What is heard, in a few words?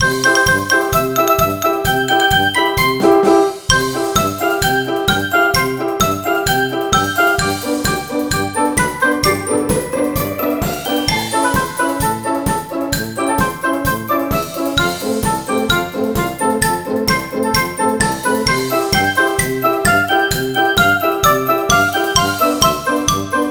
dog show music; Ringling Bros. and Barnum & Bailey; Wii